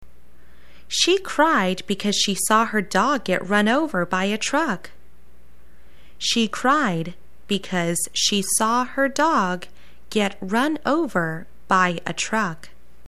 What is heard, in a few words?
cry